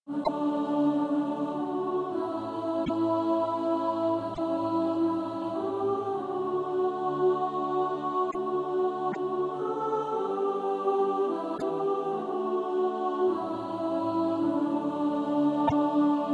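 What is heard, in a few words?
soprano, Practise Music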